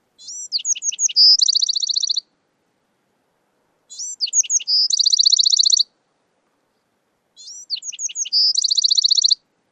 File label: song